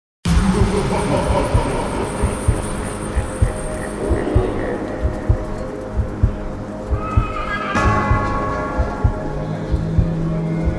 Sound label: HALLOWEEN 128 BPM